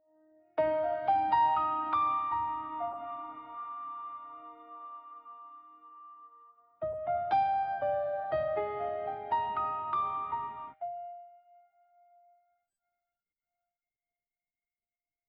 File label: instrumental